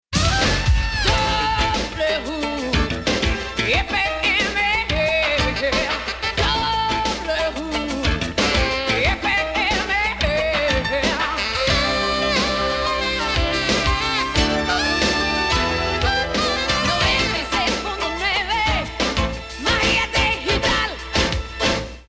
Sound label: Jingle, radio